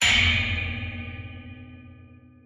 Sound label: structure blunt; Barotrauma; Subsurface; Damage